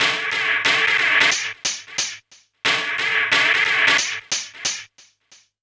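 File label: SCUM hi-hat; Big Fish Audio; Uncivilised G'rooves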